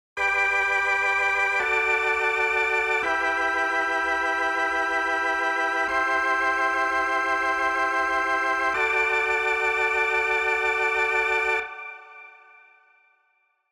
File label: wow Flutter On